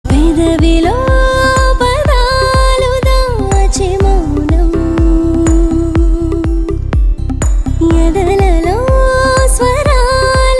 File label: Female Ringtone